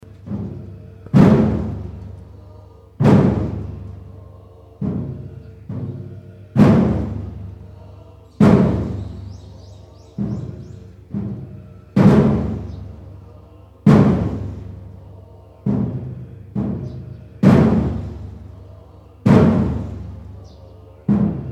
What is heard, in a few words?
Monk Buddhist Ceremony Drums Kyoto Japan; Buddhist ceremony, Kyoto, Japan. Japanese drums, taiko drum; Chanting Sound Effects; LynneMusic